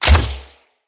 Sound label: Bow Ranged Shot; Weapons; Player; Envoy Hunter; Bows